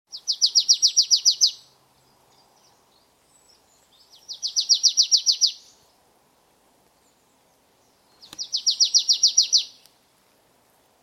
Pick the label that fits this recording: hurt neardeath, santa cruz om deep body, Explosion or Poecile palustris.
Poecile palustris